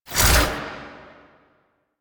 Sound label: Whoosh E, weapons; Warframe; Gunsen Prime